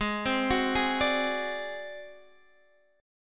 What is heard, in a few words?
G sharp 7th Flat 5th G sharp down Guitar Standard, chord; G#7b5; arpeggiated; JGuitar